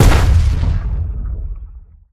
Seismic Blast Fist; Evolve; Behemoth

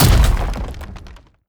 strider step; Strider Remake

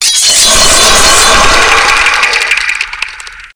multi attack

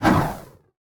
Burning Hands Whoosh; Evolve; Meteor Goliath

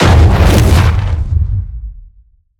Mortar Cannon Fire; Evolve; Behemoth

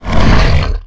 Rock Pick Up; Evolve; Goliath

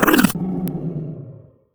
Exertion Heavy; Evolve; Kraken; Grounder3

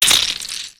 Teleporter Super Nova Blood Splat; Evolve; Wraith

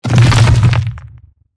Rock Throw Impact; Evolve; Meteor Goliath

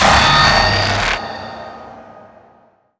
strider alert; Strider Remake

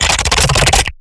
Stealth Attack Start; Lurker; Evolve; Gorgon

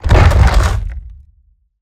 Roll Surface End; Evolve; Behemoth

